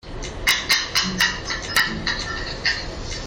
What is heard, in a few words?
hahaha